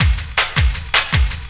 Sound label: Loop, Drum & Bass, 160 BPM; Ueberschall; Drum & Bass Flash Trackz